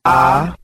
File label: "ah"